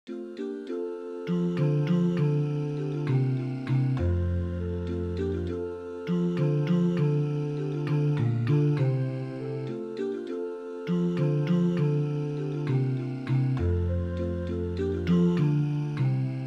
Sithi Shwele bass

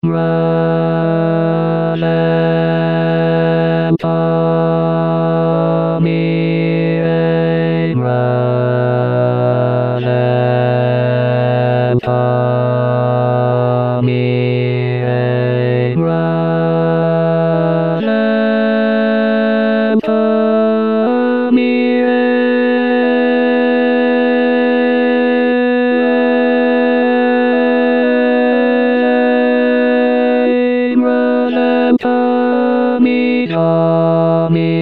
New Mravalzhamier bass

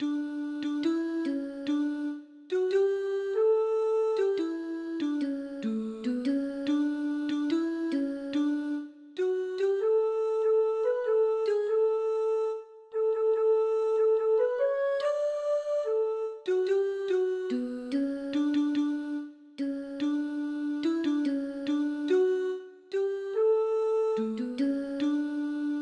All Around My Hat Tune only

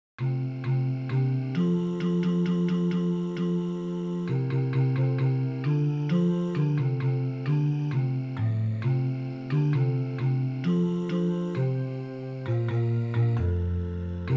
Down to the river basses

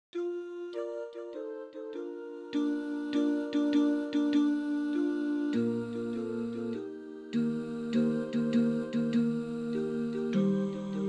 Tenors; Pokarekare Ana

